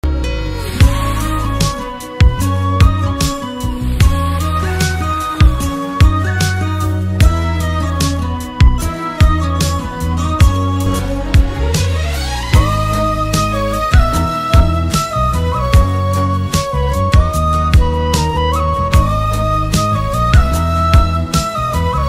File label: theme song ringtone; fidaa